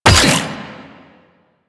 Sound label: Drone Weapon Fire; Warframe; Corpus; Corpus Lich